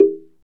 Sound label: PRC SLIT DR, Orchestral Blocks; Roland LCDP03 Orchestral Perc; Orch Toys